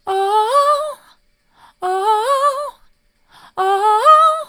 oh oh oh soft, vocal

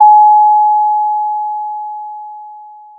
Sound of a doorbell

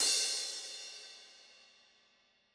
Crash, drum

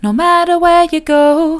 soft, vocal

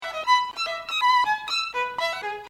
scrambled violin

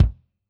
K1 close Kick, drum

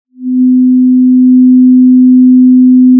Sound of a cruise ship horn

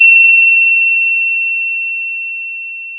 pop, Sound of a doorbell

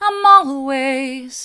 i'm allways mid, vocal

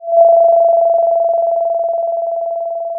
Sound of a phone ringing

